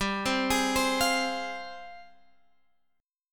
F Minor G, chord; arpeggiated

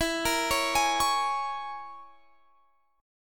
Db Minor 6th E

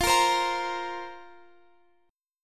F Suspended 4th F down Guitar Open F

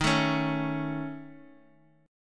C sharp Suspended 2nd Sharp 5th D sharp, chord

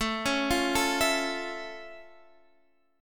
F Augmented Major 7th A

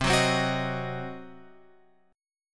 C Diminished C; Cdim Chord {8 9 10 11 X 11} strummed

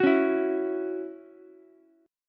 C sharp Minor Double Flat 5th, Ukulele; strummed; 11,13,12,x

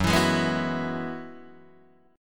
Gb 9th Suspended 4th Gb down Guitar DADGAD, chord

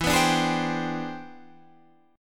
E 7th Flat 13th Sharp 9th E, guitar; strummed

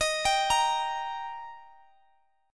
Eb Major, down, Guitar, DADGAD

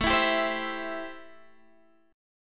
A-Minor 7th A Guitar Standard; x,12,14,12,13,12; Am7 strummed; capo=3

